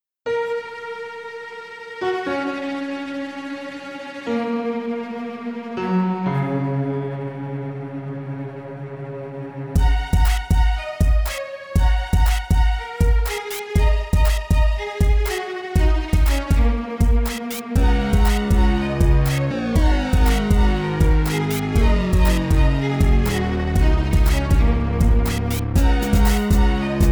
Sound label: cello beat